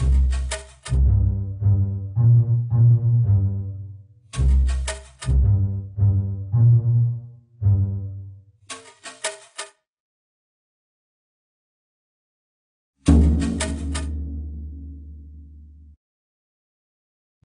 Jungle Level

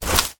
Umbrella Close; bad piggies; android; mobile; windows

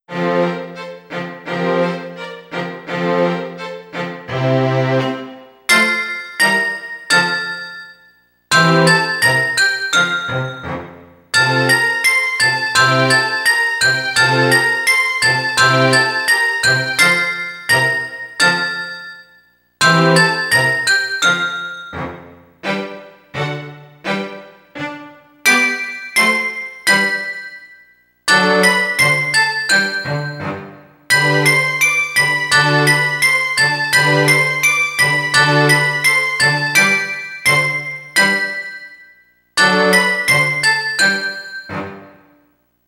Hum, Hum, Hum

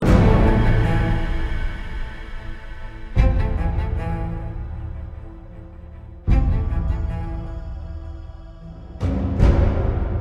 Sneaky Stinger; Global Conversation

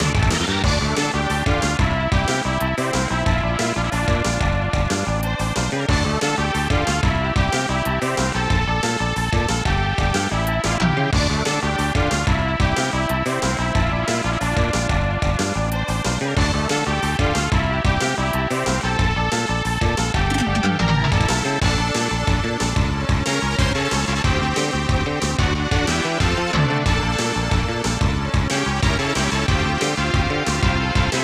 Battle

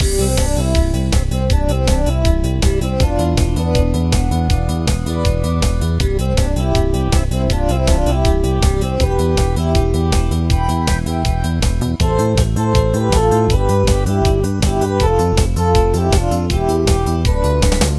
Congratulations!; Super Gerball; macOS; Windows

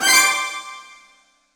Jingle Unlock; Garfield Kart Furious Racing

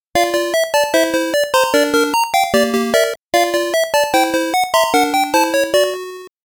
Game Over (SMS Version)

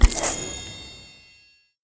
Menu Button Buy Gem; Angry Birds

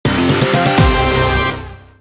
Battle Intro; Kirby Battle Royale

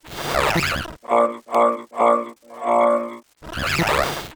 Glitch

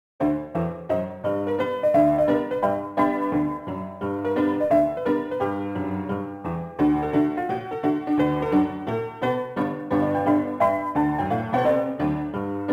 Salloon; Nevada

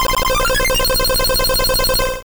Hyper Beam; Pokemon; Gen 2; attack moves; GSC

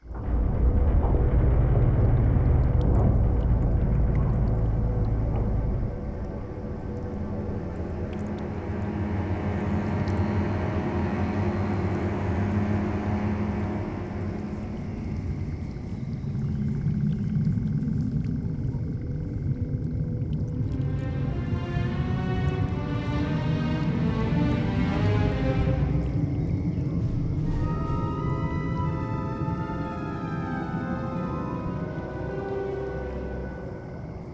Marsh Ambient; dreamcast; Star Wars Episode I Jedi Power Battles

